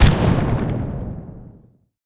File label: shvak shot, guns; War Thunder